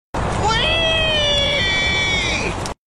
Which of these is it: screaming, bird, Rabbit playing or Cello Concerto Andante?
screaming